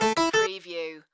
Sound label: Casino Slot Machine Harpsi